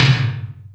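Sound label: Lo Return, Alesis D4 drum machine tom; 300 Drum Machines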